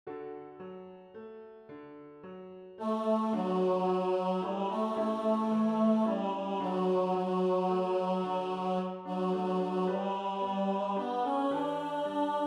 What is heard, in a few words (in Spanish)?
tenor; Cantata para SATB, Solistas y Narración